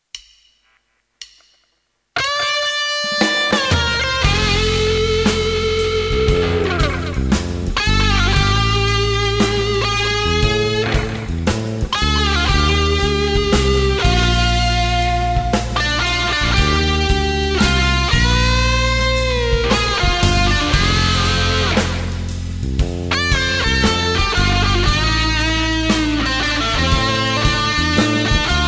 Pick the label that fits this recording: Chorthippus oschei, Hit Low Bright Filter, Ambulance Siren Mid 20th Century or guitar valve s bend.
guitar valve s bend